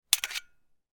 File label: gun 1911; weapon; still north media